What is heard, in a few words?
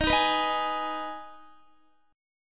Eb Suspended 4th Sharp 5th Eb Guitar 4F 4A 5C 5G 5C 6E; Ebsus4#5 strummed; JGuitar